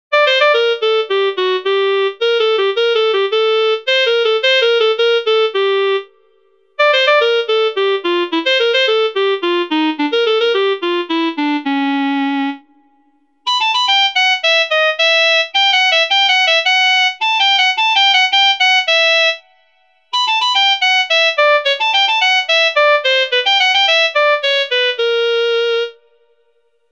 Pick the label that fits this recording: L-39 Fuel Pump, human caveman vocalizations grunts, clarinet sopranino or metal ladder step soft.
clarinet sopranino